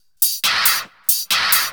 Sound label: percussion loops, 138, Techno